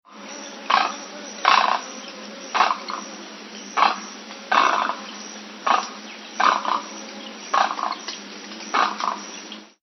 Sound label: great egret